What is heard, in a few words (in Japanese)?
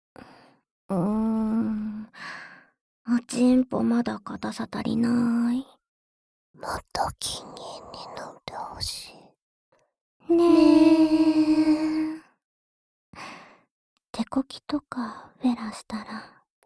耳舐め手コキとフェラ; Ｗハメ乞い